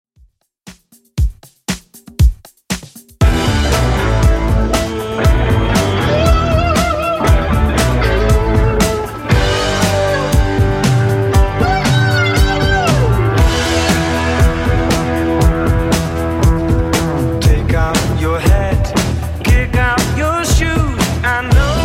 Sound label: 70s, 118bpm